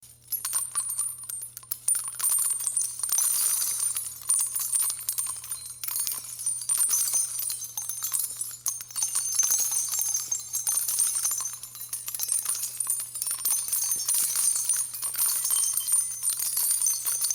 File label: gun shells dropping